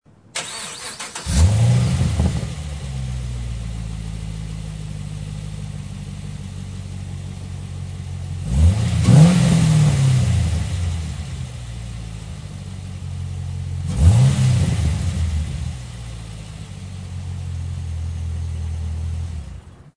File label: Super Turbo; dynomax